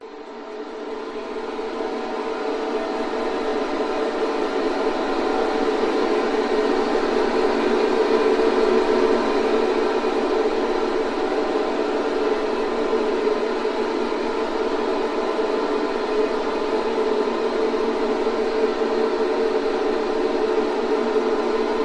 science fiction atmosphere planet constant wind drone desolate; zapsplat